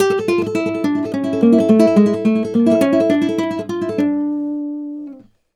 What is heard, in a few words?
Flamenco, Guitar, dm